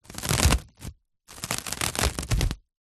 household cling film wrap stretched hand fingers rub across